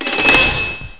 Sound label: lever; XDM; buttons